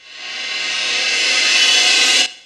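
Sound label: Reverse cymbal; Mortal Kombat II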